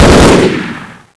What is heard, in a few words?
mgun, hgrunt; Half Life Model Pack; Half Life 1; valve hd